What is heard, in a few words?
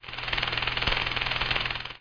Elec Effect; The War Engine